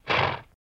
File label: Horse Blow Generic